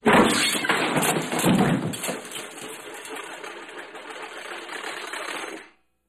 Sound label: Debris Crash Large